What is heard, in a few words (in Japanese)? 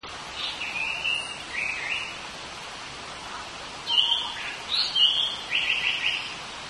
sanko